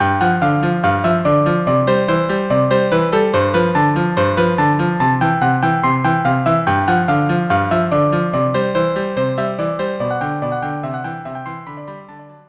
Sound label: Galop violin cello duo